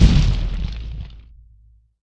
STEP BOOM

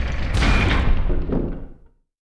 gate stop

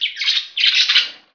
bird

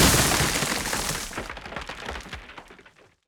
green tentacle burst

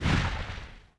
FIREBALL COLLIDE

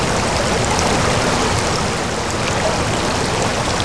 river

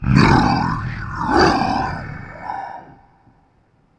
DIE

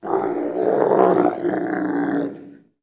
orc die

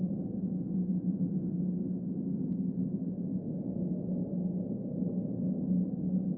wind cave

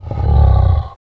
AMBIENT

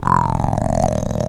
die; mahlendur

